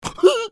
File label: Pig hit; bad piggies; android; mobile; windows